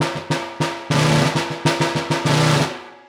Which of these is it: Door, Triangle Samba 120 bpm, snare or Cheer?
snare